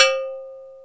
COWBELL MD; Back In Time Records - Big Bang

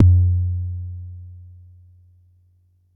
Bassdrum, Jomox Xbase09

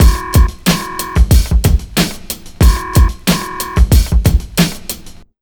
92 beat, Underground Hip Hop; USB Soundscan vol.02; AKAI

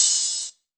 HH, Drum Mashines; EdgeSounds

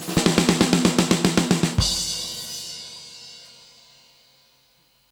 Fills, Monster Beats; Zero-G

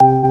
ST ORG C3, ORGANS, House Side Of 2 Step; USB Soundscan vol.51; AKAI

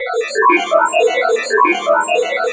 TRONTALK C6; Best Service Dream Experience

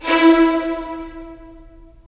STR VLS, violins; Roland - LA Composer vol. 4

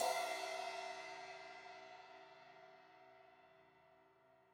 Crash 16in edge, Cymbals; Deeply Sampled Drums

